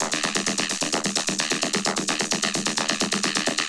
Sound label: Vocobeat; Sonic Foundry (Sony Creative Software)